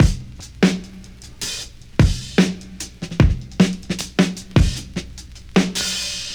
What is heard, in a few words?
76 bpm 00s drum loop D# key, Free drum loop sample - kick tuned to the D# note. Loudest frequency: 1258Hz